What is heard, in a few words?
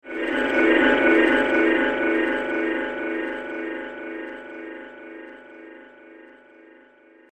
The Magic Resonates; Magic Sound Effects; fantasy sound effect